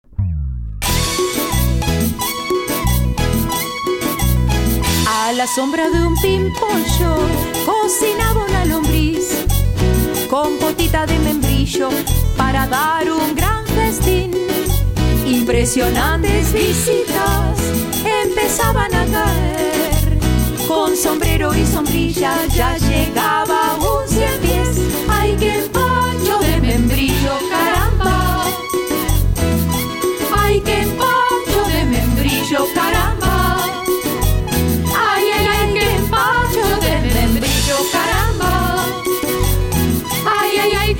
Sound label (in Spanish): cumbia, ocarina